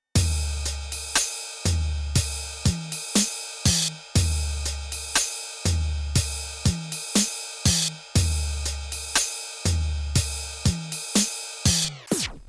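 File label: NTS-3 Drum Vinyl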